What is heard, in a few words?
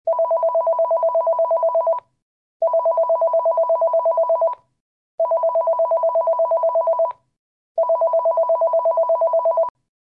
ring